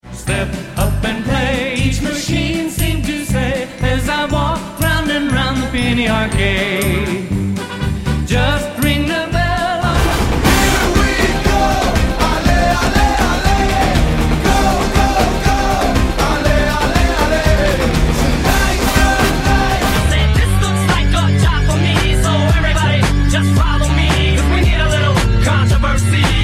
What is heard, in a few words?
Tempo, pop song